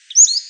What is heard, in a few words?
song